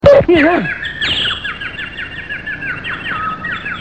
birdies

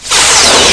phaser; Star Trek

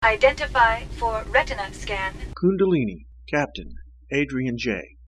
ID scan; Star Trek; Kundalini

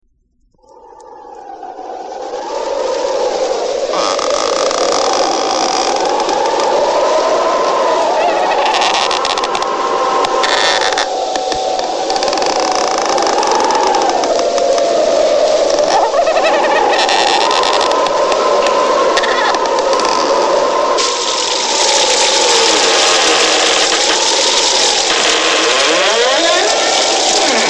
Creaks; Halloween; A Collection Of Creaks

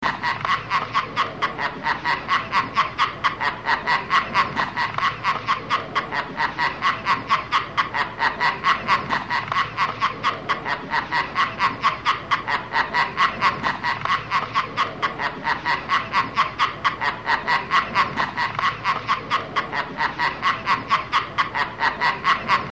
mech laff; Joker

